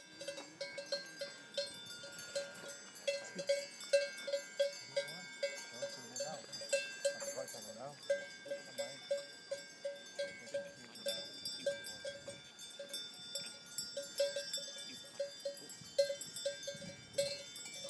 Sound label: cow bells up a mountain near mayrhofen